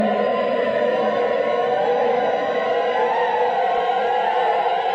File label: Choir; Armello The Bandit Clan